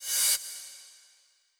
cymbal reverse high

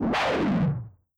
beast roar distorted

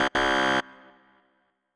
incorrect answer buzzer

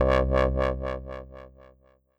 synthesizer acid bass

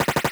standard shot short x4 loop

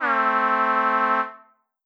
synthesizer vehicle horn low

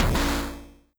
robot footstep long

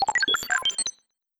computer beep

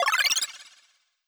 heal short

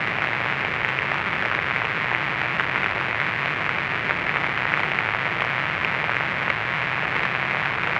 synth noise loop

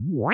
inchworm locomotion medium fast

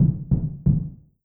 door knock iron synth from the other side

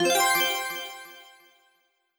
accent brilliant middle fast

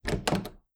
door small room open near

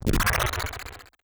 strengthen

